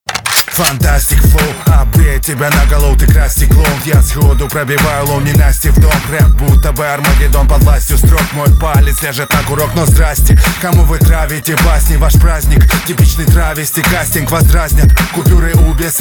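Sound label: instrumental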